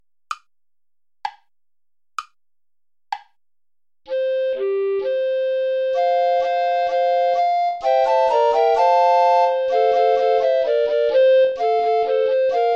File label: play along without bass recorder; praetorius michael; psallite unigenito